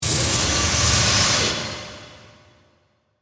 Machine Pressure, Scifi, Machine, Pressure, Release, Motor, Whine, Automatic, Door, Close, Science, Fiction; Spacecraft